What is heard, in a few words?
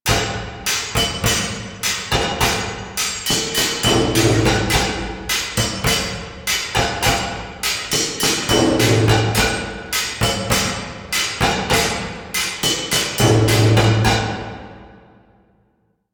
Music Exterior, Ambience; Vent Kids Skeg; Warframe; Venus Open World; Venus Solaris United